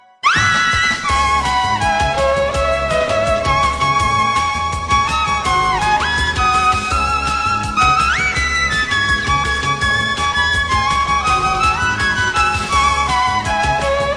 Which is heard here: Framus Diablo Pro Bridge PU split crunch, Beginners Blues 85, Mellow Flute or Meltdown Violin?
Meltdown Violin